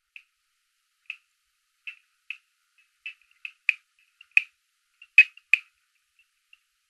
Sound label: Boophis microtympanum